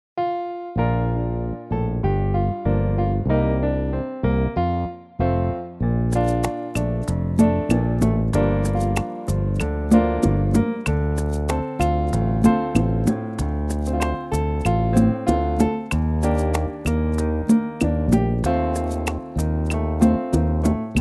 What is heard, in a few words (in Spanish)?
Kyrie Pista instrumental sin melodia